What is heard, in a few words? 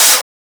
SUPER C HAT, PHUZZ DRUMS; Spectrasonic Distorted Reality 1